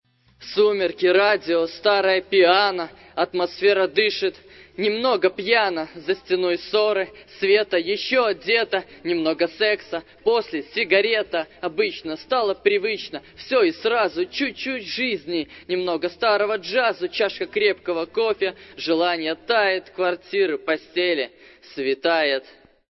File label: piano street